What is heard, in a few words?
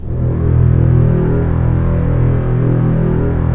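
STR CBS, Strings; Roland - LA Composer vol. 4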